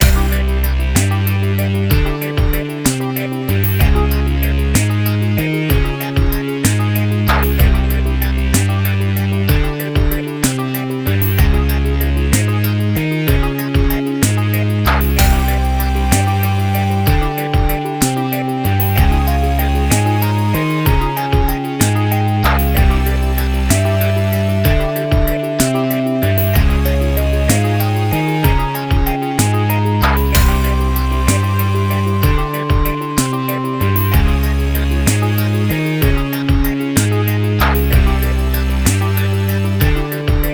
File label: BGM Shop Fishing; Kenka Banchou 6 Soul Blood; 3DS